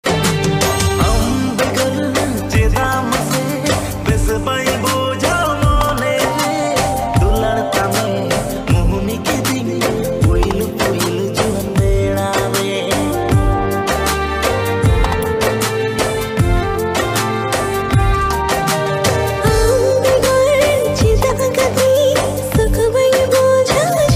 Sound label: santali ringtone